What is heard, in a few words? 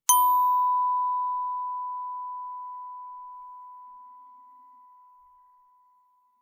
bells brass ff B5; Percussion